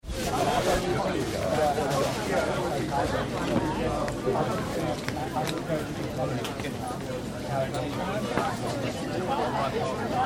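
Town Market Atmosphere - Crowd chatter - voices and activity; some close voices and activity - background traffic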